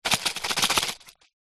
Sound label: household medicine antibiotics box shake